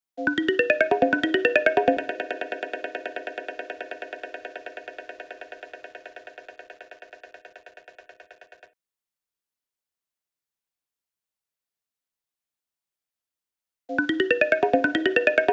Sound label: Synth